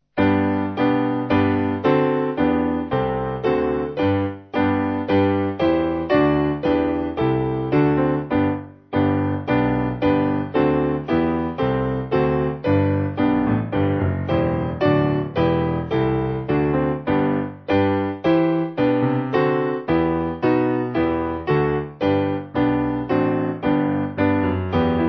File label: Piano